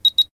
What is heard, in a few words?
camera focus